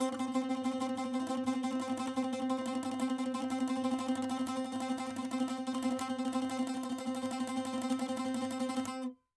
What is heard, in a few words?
saz